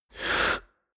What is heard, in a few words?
inhale male